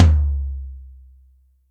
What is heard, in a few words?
Maple, Acoustic Tom, Yamaha DTXpress